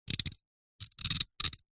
household comb metal teeth scrape with sharp metal